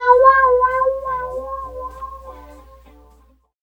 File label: GTR; East West; Phat & Phunky